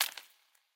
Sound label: footsteps mudroot; Fate The Cursed King